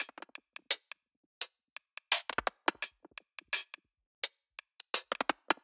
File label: percks, Drum loops